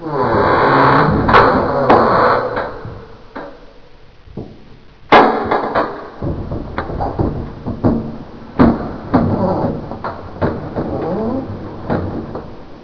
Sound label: ice grind loop; hl2